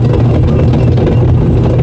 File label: tiger